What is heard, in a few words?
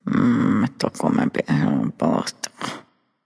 nv grumble; smite; awilix